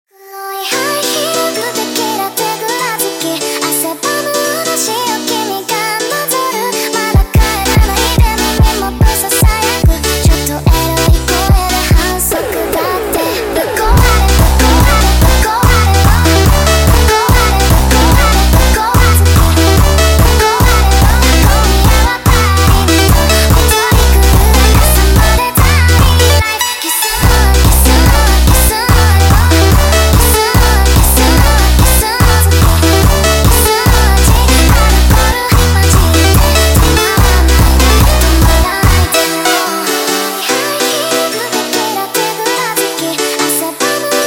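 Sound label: sped Up, phonk music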